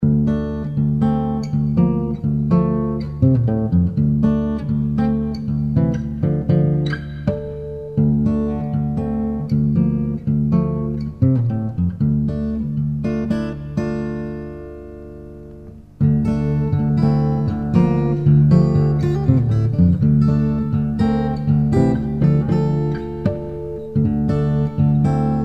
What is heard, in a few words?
silent blues